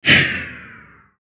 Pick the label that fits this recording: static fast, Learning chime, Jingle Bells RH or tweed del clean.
static fast